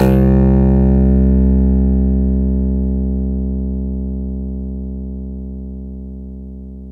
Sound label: BRITEKLAV, KURZWEIL K2000